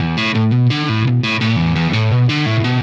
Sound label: Static Guitar; Sonic Foundry (Sony Creative Software); Indie Rock Anthems; Session 13 - Static